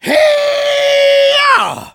battle cry; Engineer

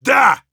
cheers, ru; Heavy

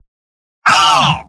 Spy pain severe; mvm

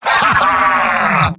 laugh short; Robot-filtered lines; Demoman; from MvM; Demoman Robot audio responses

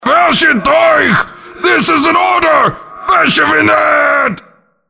Krampus death

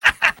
Soldier laugh short; mvm

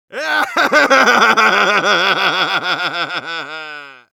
laugh long, ru; Medic

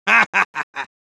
laugh evil, es; Medic

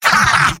laugh short; Demoman; MvM

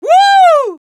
Scout positive vocalization

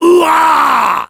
cheers, fr; "Hourrah!"; Soldier

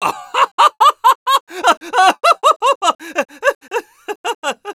laugh long, es; Scout

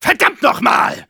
Engineer negativevocalization, de

